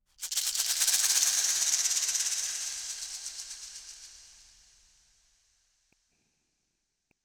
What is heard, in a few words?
Shaker Hit